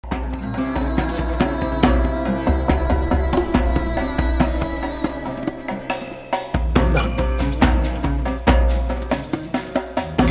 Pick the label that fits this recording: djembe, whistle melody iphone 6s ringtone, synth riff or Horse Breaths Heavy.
djembe